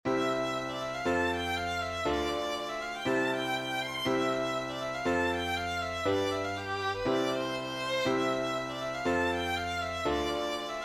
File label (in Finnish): polkka